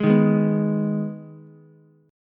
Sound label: Ab Minor Ab down Ukulele; Abm strummed; JGuitar